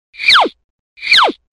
Cartoon Quick Sounds Car Horn

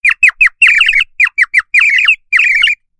Birds Chirping Sounds Car Horn

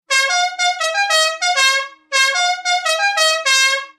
Sounds Musical Car Horn

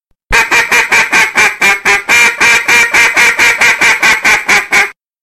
Duck Call Comeback Call Sounds Car Horn

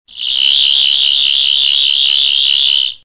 Sonic Screwdriver Sounds Car Horn

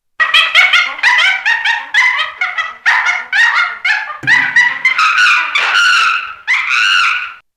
Angry Chimp Sounds Car Horn

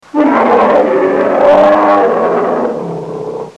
Grizzly Bear Growl Sounds Car Horn

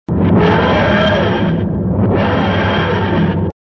Time Machine Sounds Car Horn; Tardis

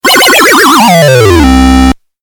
Lost Life Sound Effect Car Horn

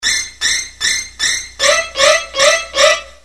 Psycho Stab Halloween Sounds Car Horn

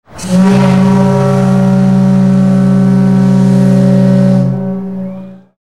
Whistle Sounds Car Horn; Titanic

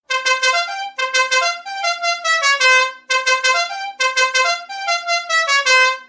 La Cucaracha Musical Car Horn

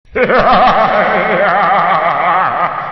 Villain Halloween Sounds Car Horn

